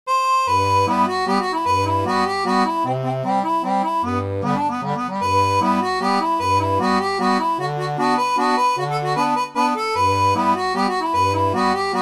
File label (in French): orgue barbarie